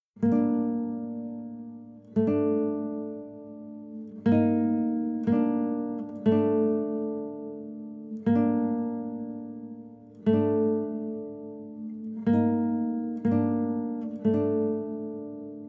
Savannah Music

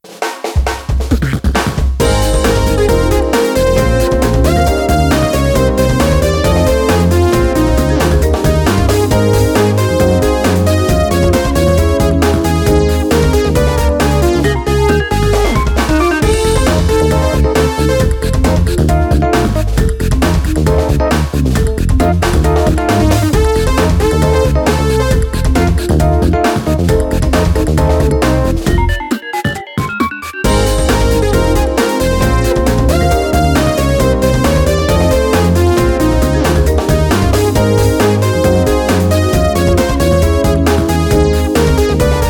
Music Event; eBaseball Powerful Pro Yakyuu 2022; ps4 switch

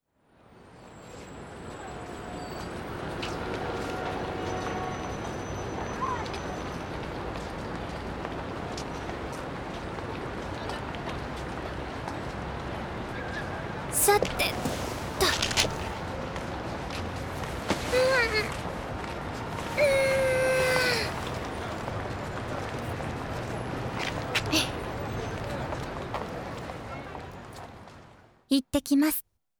Strings; Ange no Gensou

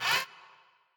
Door Servo Unit Start; Prison Architect

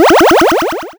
Section Clear; Blastmorph Pinball; xbox series xs

